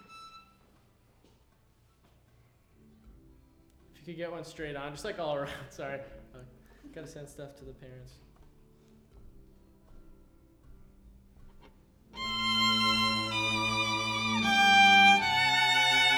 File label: violin